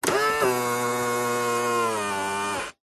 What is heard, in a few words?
vehicles car suv nissan patrol auto wing mirror fold in motor whirr